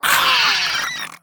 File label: Rat Death